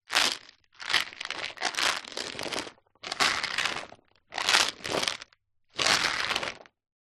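household medicine vitamin c tablets movements plastic tub; zapsplat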